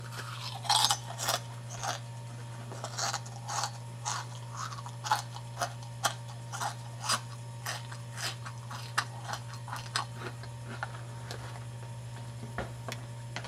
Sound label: Eating Cereal